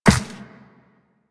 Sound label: Walk Ice, Footsteps; Warframe; Operator